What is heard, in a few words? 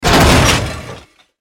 Enemy Artillery Hitreact; Godzilla Smash3